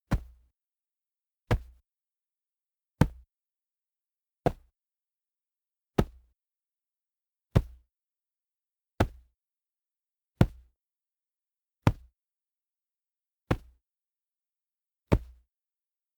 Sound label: monster footsteps slap; schritte